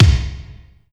kicks

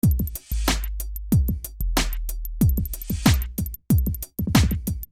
Beat

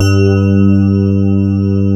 pad piano; East West; Global Sounds

